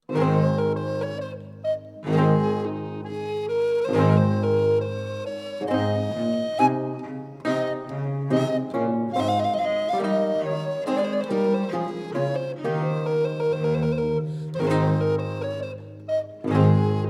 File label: Branle de village